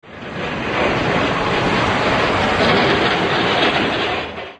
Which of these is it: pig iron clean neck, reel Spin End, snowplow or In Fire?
snowplow